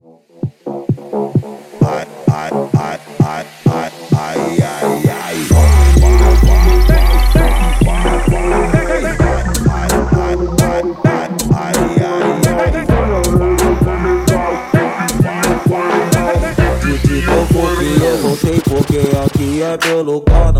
mega funk automotivo

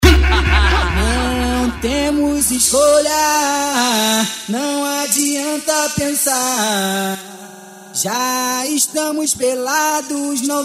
funk ritmado; especial cuiaba

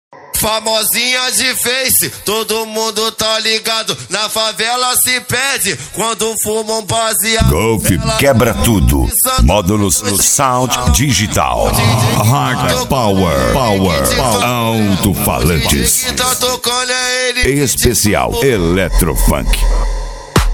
eletrofunk

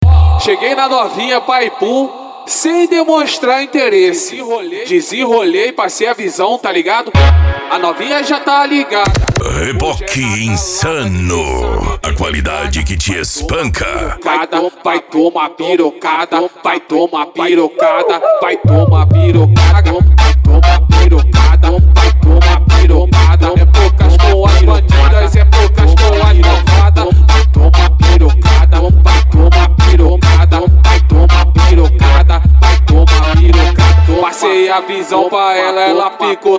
noia dance e funk mega bass

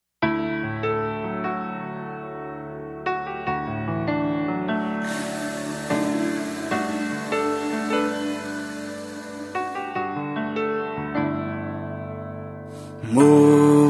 eletro funk e sertanejo

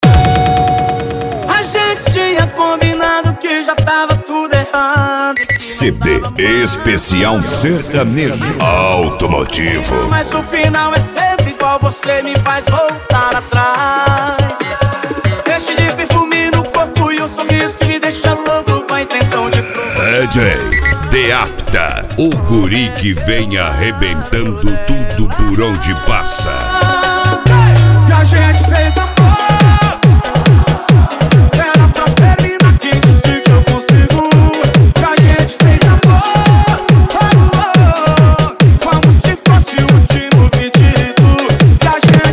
sertanejo automotivo

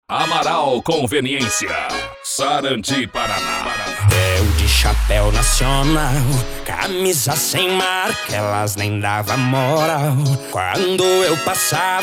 funk e sertanejo; amaral conveniencia; sarandi pr

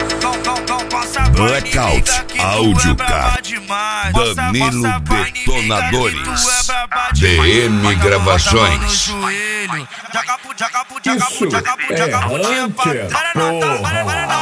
funk house remix

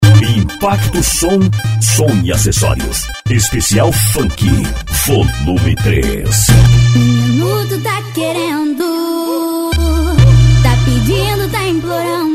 funk bass; impacto som

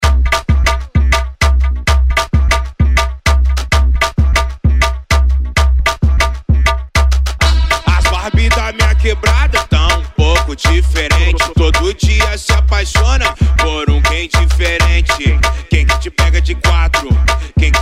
funk agressivo

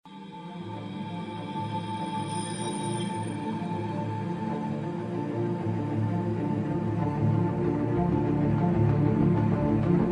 som automotivo; equipe redbass

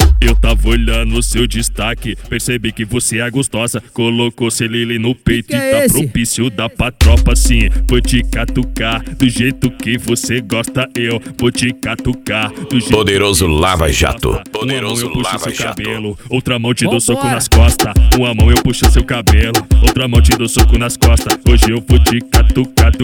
funk sertanejo

